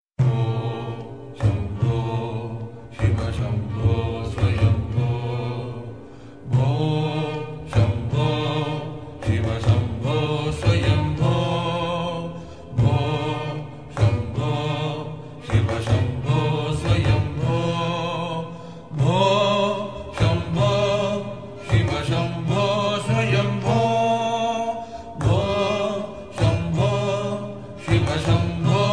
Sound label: Bho Shambhoo Shiv Shambhoo Swayambho Slow Version ringtone